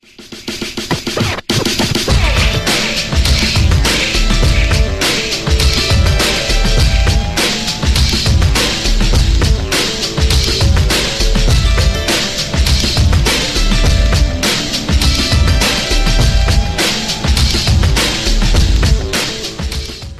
big beat; ringtones

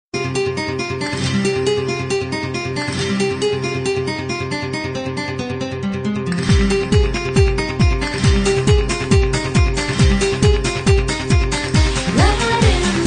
oh holy night guitar; ringtones

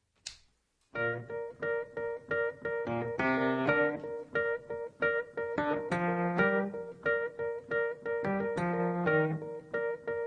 acoustic

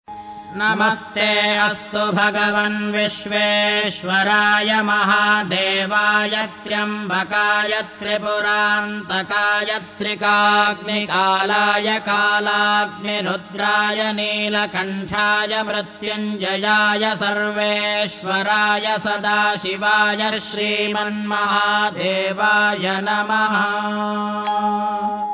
sivan mantharam

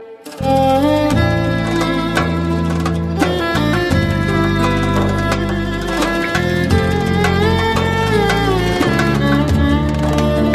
turkish classical

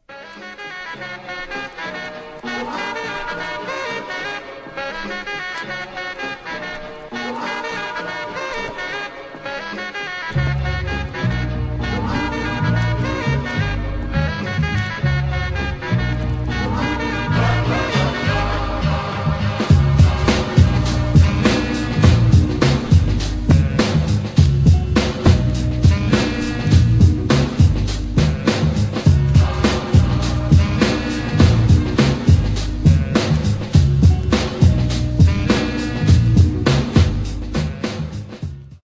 instrumental

